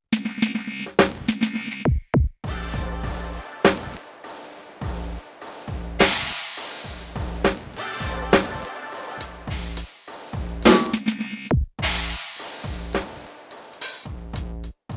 YAMAHA DTX6K3 Kit7; Off The Hook

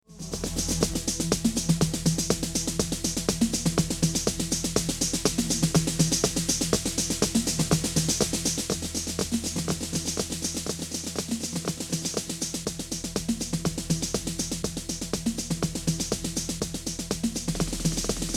Loop

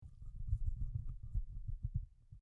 Hand AKG C535

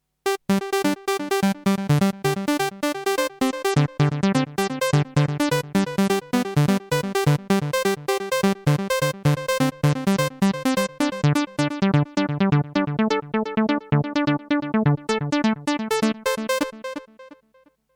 arp dry; iridium

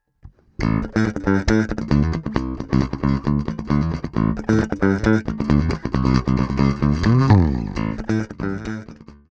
Pick pm neutral; HB Block 800b

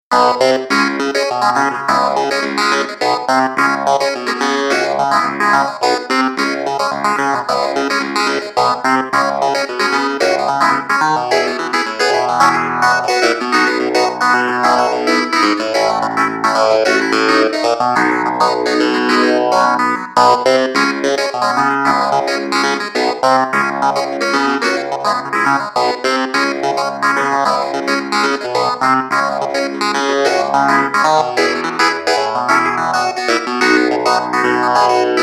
Nord Grand Clavinet Customized

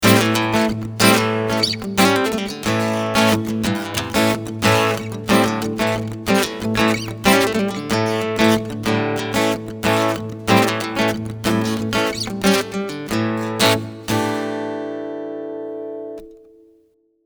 Strumming Piezo

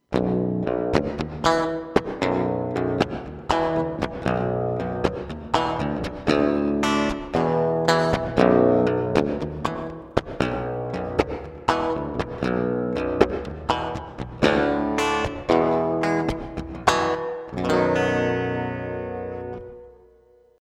Keeley Hydra Room Reverb

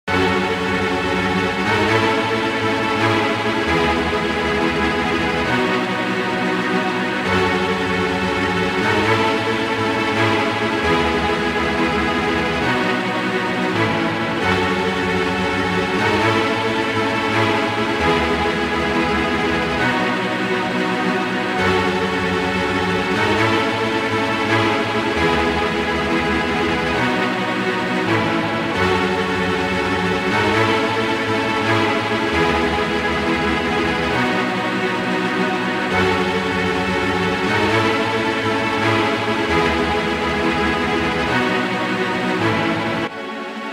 Tremolo String Arp Dry Run

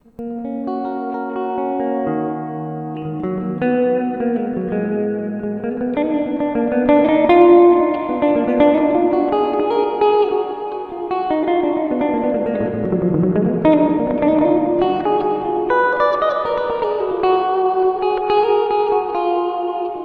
cave

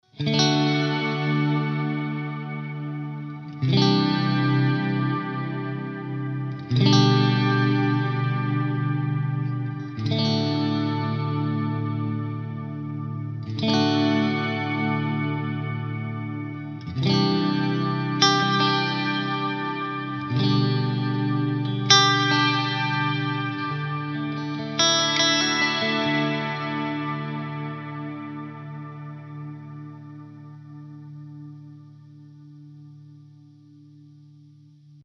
shimmer

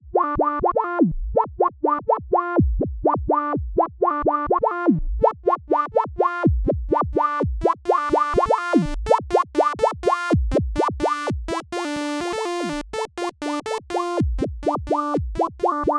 Linnaeus Filter Type Morph; Rossum Electro Music

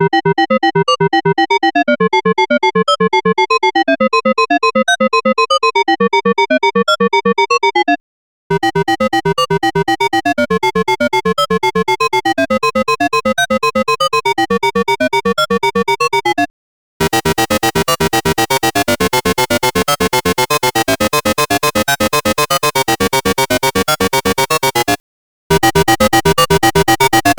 Dry sounds Exp FM

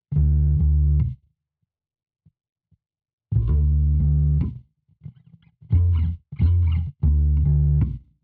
BASS de MUD IN Oeksound Soothe2

